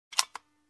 Machine gun click

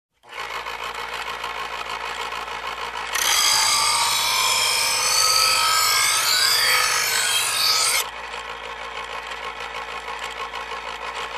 Automatic Knife sharpener

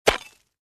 Smashing clay tile

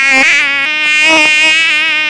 Mosquito buzzing

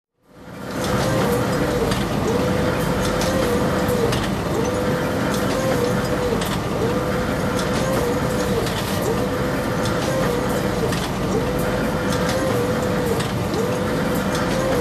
Hydraulic Industrial Machine running

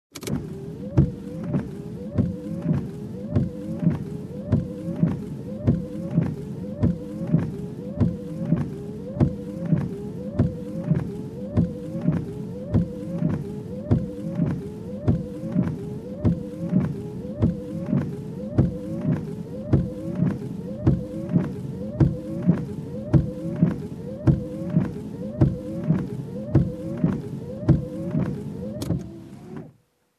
Car Windshield Wiper fast

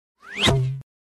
Sucking Blop sounds

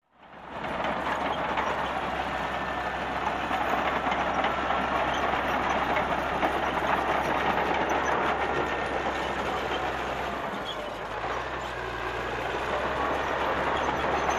Trencher in a construction site

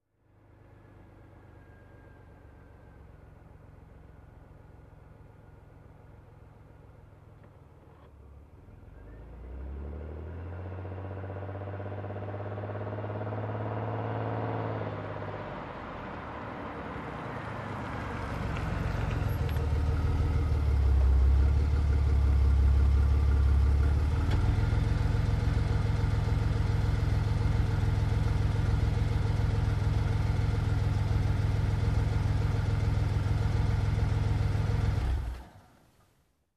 Car approaching and parking

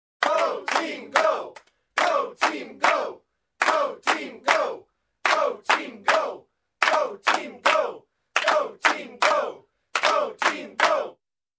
Fans cheering for their team

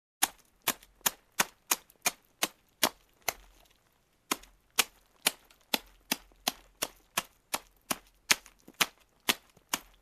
Loosen soil with hoe

